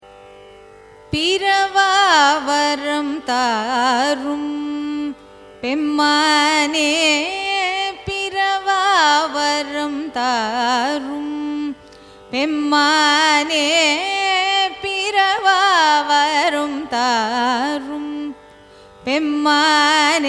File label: raga lathangi carnatic; carnatic music